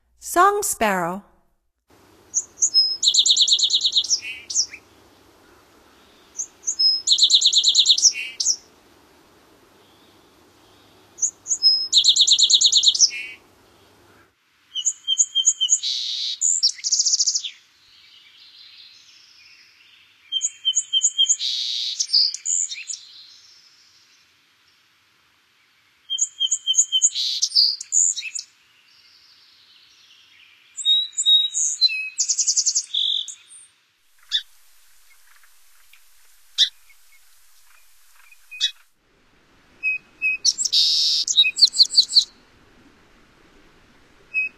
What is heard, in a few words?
Song Sparrow; Stokes